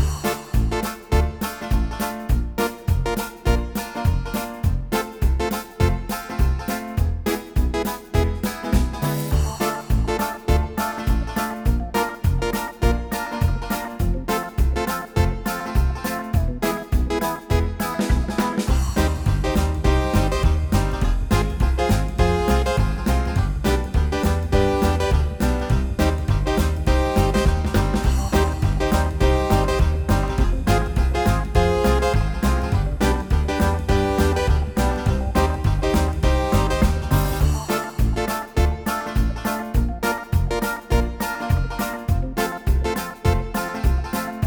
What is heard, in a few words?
Banjo Buddies; chiptunes